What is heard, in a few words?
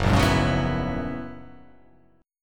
Gb 11th; Gb11 strummed; JGuitar